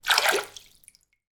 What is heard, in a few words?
household water in sink movement